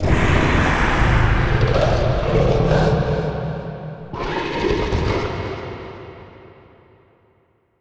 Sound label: Distant Absctract Scream Short; The Decline Of Warriors; Monster Sounds Volume II